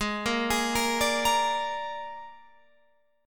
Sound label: G sharp Suspended 2nd Flat 5th, Guitar Open F; G#sus2b5; arpeggiated; JGuitar